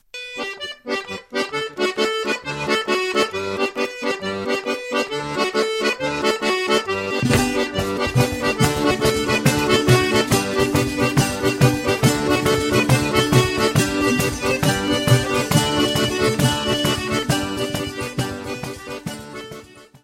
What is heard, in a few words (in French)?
Tarentelle Albanaise - Italie - Sicile